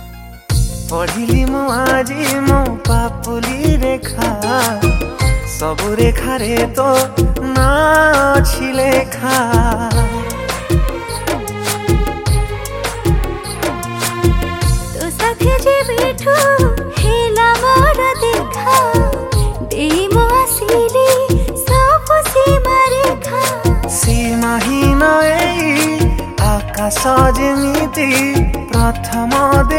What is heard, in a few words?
Romantic Song, Odia